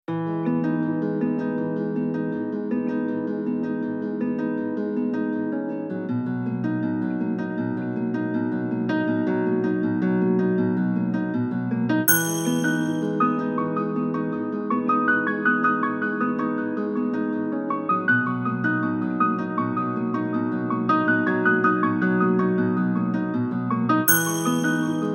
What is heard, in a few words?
chill japan calm simple nostalgic